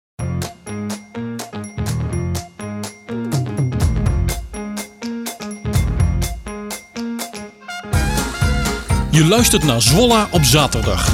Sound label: Jingle; Zwollah2